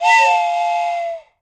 Toy Train Whistle: Double Pitch, From Close Perspective.